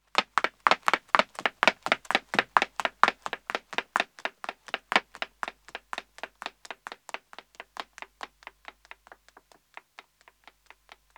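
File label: Pavement Running 1 woman depart; Footsteps; BBC Sound Effects Library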